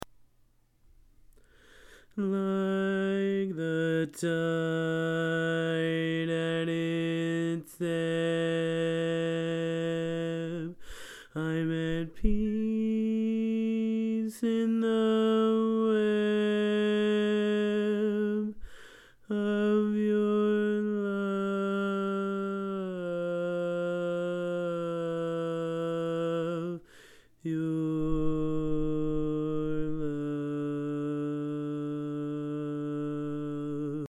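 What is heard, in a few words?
Baritone, barbershop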